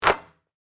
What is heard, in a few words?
Basketball Net, Sports, Swish; Blast Wave